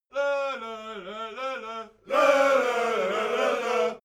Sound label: army song segment